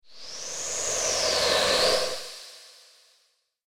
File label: Graveyard Wind; Scary Soundscape Sound Effects